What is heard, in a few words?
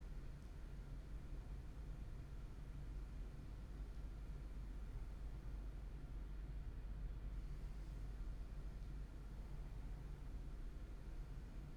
Background Noise